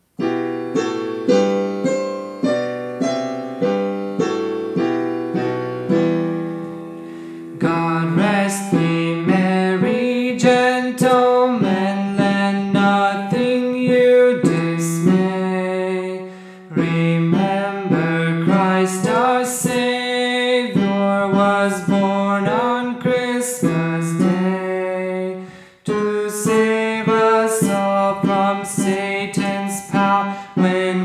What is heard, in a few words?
Tenor